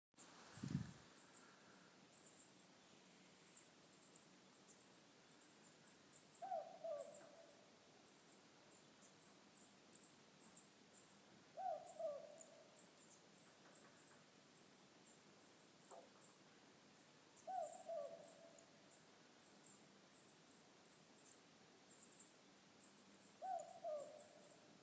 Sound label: Powerful owl